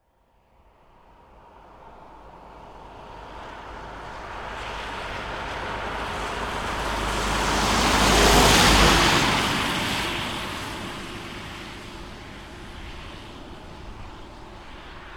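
renault master 2.3 dci wet asphalt exterior pass slow long whoosh; Soundholder; Renault Master IV 2.3 DCI 165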